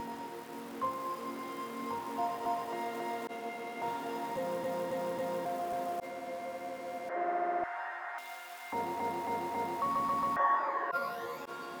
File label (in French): GLITCH